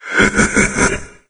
taunt; doom3; sabaoth

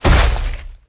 step run

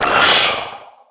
attack

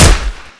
masada sil, weapons; masada magpul

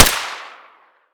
mp5 fire suppressed; weapons

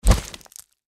deathclaw foot; npc

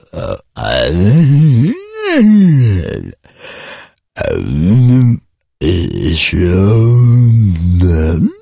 zombie idle

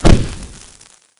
imp exp; doom3

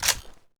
ems pump; weapons

